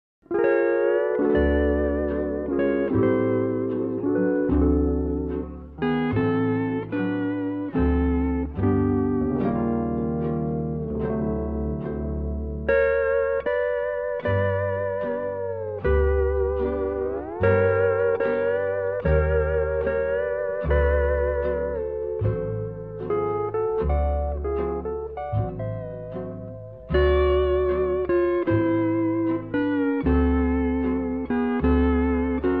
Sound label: Instrumental